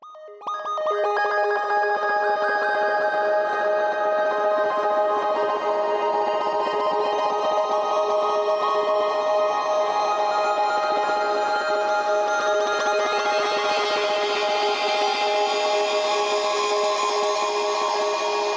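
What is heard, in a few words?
FX Atmos Ab Major Arp; ambient or nature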